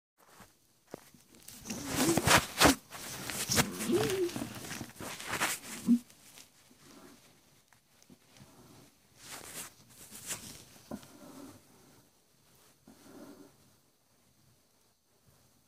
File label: squeeze box